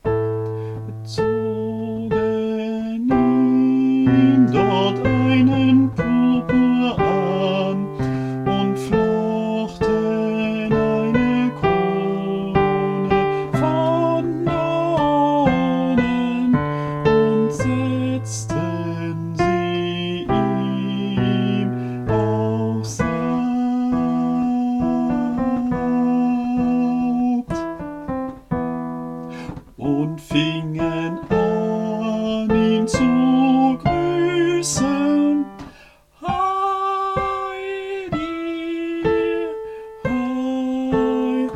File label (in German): Sopran; Zogen ihm dort